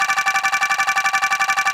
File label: ui gem Counter LOOP